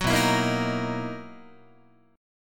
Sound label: B Major 11th B down Guitar; strummed